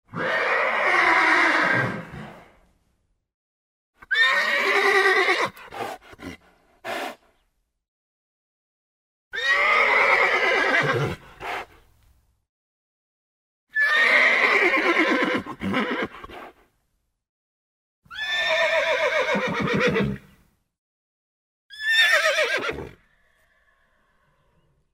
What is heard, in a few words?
neighing horse